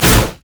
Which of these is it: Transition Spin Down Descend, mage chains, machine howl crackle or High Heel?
mage chains